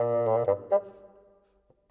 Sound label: Bassoon, Orchestral; Sonic Foundry (Sony Creative Software); Orchestral VOL-2; Modern 27